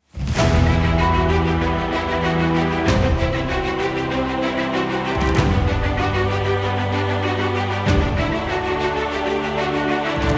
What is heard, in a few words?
Cinematic Epic